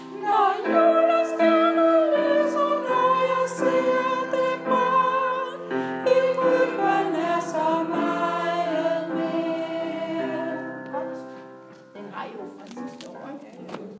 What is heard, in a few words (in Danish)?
omkvæd sopran